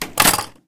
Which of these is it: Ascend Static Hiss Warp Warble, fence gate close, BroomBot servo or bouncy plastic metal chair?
fence gate close